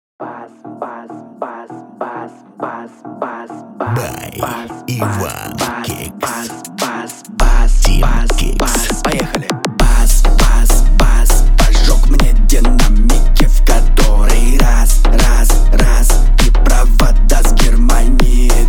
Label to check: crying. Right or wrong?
Wrong